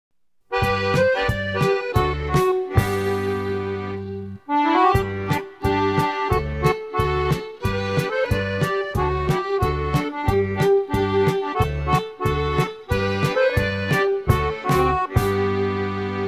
instrumental

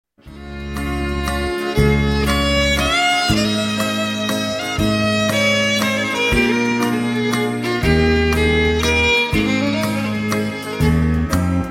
blue pacific waltz (instrumental)

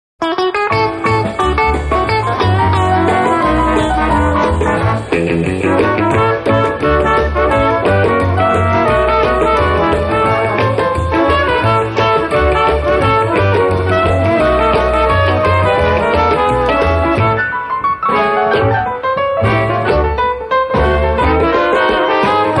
instrumental

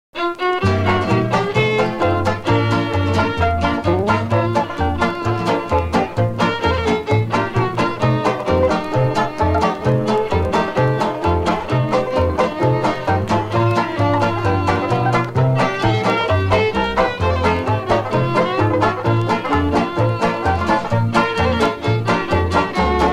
instrumental

